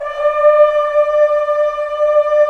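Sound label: brass trombones; Roland LA Composer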